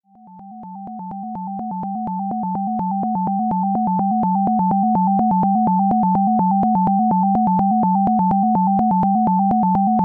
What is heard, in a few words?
cambiata illusion; This is a stereo sound file